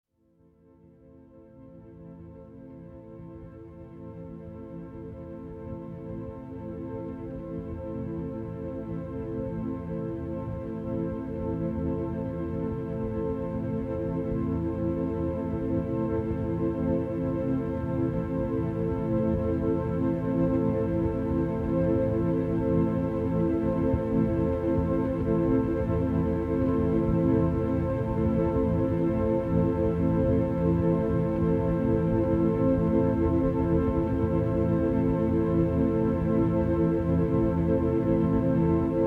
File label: Synth Drone; Nordic Drama Drones